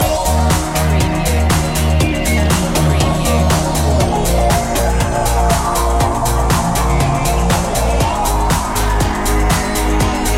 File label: soft techno pulse loop